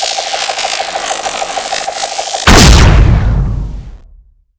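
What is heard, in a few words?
shoot explosion, weapons; guillotine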